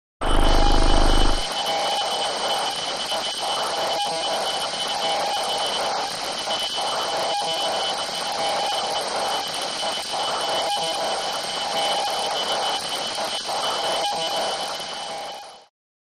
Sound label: Noise Phantom HF Feed